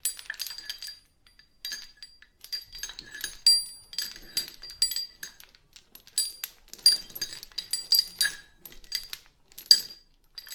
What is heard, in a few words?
zombie chain; michael; DeadFright